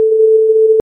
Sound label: volume random 64hz